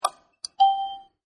Ticket Selection, Metro Subway Ticket Machine Button Press And Selection Beep; Beep Sound Effects; Multimedia